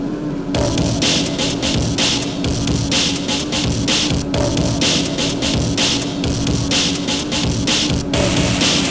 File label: breakbeat